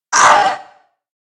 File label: pain